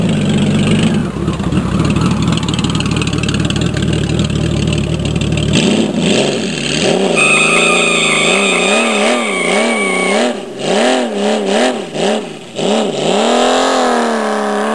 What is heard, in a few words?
Honda 1800cc Valkyrie Boxer 6 engine sound; 2002; Section Six: The Soul of a Bike – The Engine